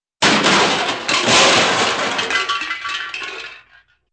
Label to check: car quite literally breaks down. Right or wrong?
Right